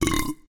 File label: burp; minecraft; SMCNV-pack